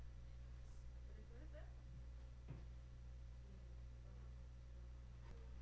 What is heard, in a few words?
giggle